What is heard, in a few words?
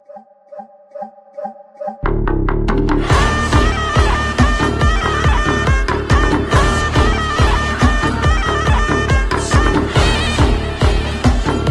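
background music ringtone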